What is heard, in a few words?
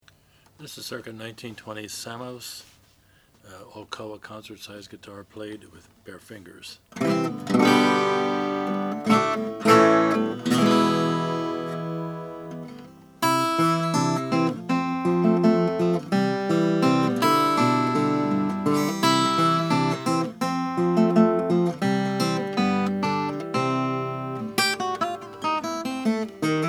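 sammos koa natural, Guitars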